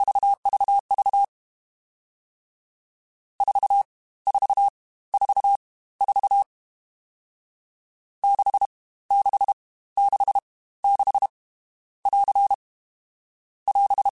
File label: CW Code Groups Mixed Short Runs Staccato; Morse Code; HamRadio